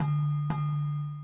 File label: Receive Sms